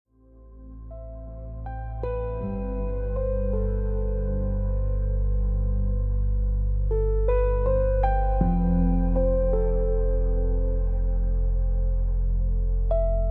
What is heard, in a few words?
Study Beats